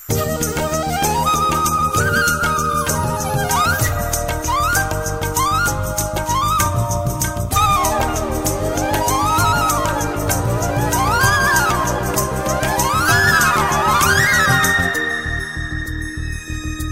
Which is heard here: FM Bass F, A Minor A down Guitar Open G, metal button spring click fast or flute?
flute